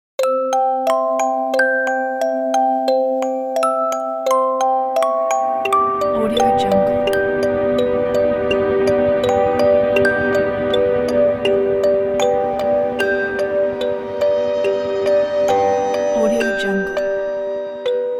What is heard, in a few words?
creepy music box